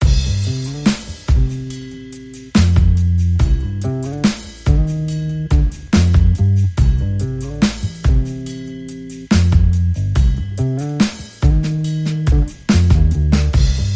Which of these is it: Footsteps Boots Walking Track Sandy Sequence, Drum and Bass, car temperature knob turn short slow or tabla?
Drum and Bass